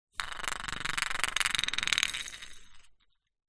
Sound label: leisure toy spinning top plastic on wood